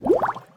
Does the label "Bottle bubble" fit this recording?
Yes